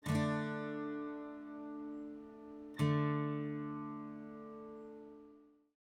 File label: GTR Dry Lower